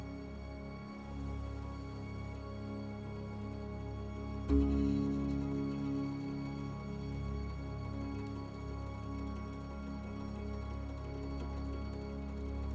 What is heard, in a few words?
Music Menu